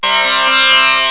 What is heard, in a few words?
tambour, indian; Sounds Terrific II; Weird Science